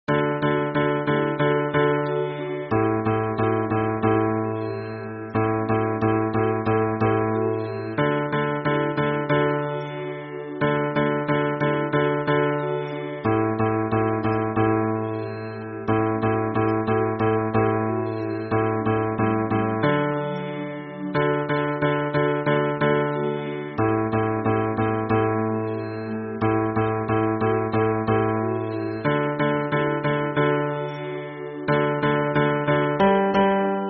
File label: hymn bass